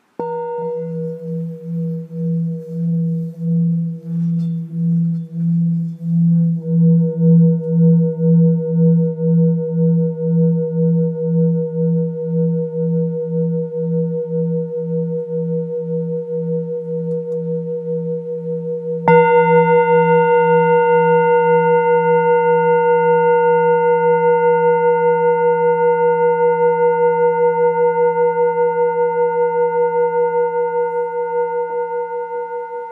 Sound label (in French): Bol bengale 900g-1kg Si